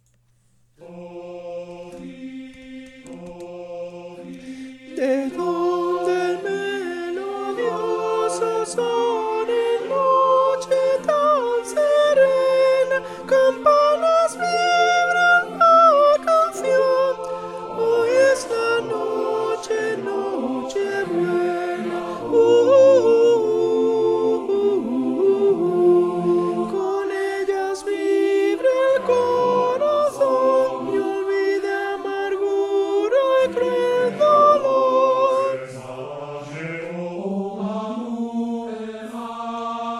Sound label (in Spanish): sopranos